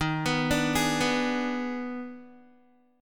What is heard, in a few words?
Eb Major 7th Suspended 4th Sharp 5th, Guitar, EbM7sus4#5 chord; arpeggiated; 11,14,12,13,11,x